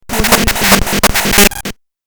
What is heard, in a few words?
glitch squad